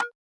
nasty stormfront; Nasty Stormfront Electro FX

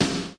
snare; REAL LIVE KITS

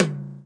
mid tom

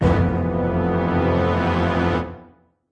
orchestra hit melody kit

